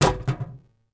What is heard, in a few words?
club hit metal; objects; Star Wars Obi-Wan; XBOX